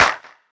clap one shot a sharp key; single hits one shots

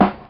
round acoustic snare sound g key; snare drum samples

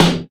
fat snare one shot f key; snare drum samples

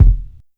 rich lows kick drum sound e key; tuned drums e key

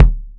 clear kick c sharp key, Loudest frequency: 169Hz; kick drum samples

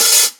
short open high hat g sharp key; open hat samples

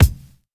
small reverb bass drum sample D# key; kick drum

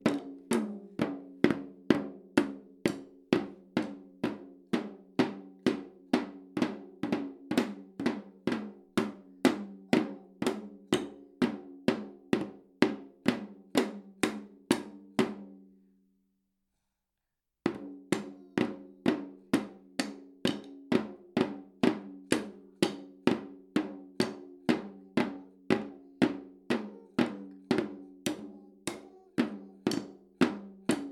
little boy playing a toy drum, repetitive hits

